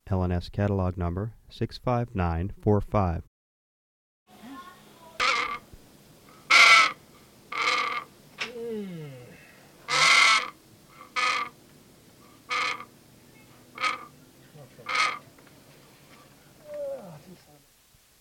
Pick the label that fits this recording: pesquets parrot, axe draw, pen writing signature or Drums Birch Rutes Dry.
pesquets parrot